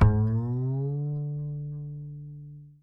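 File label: acoustic bass slide up 3; AC.SLDUP; Masterbits CLIMAX 7 - Bass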